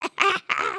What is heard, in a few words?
giggle